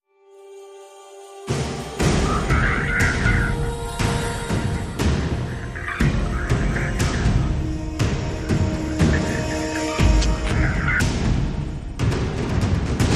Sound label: Percussive Builder